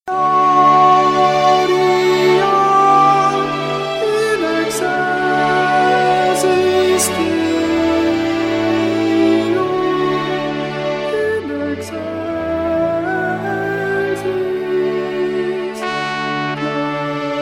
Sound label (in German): Motette Alt